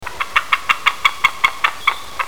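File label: white-tailed eagle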